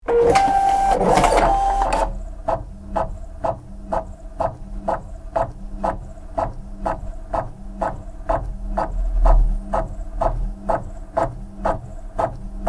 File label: printer; electric